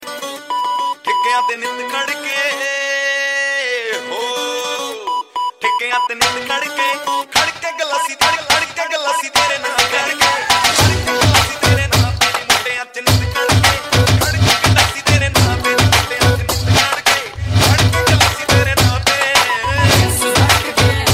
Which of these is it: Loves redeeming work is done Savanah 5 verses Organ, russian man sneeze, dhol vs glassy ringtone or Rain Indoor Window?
dhol vs glassy ringtone